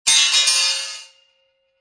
Metal Drop On Concrete And Ring, Impact, Metal Dropped On Concrete, Crash Ringing After Impact